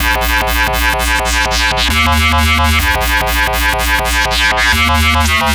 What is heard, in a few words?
Popwow Bass, Drum n Bass; KJ Sawka; Drum n Bass & Beyond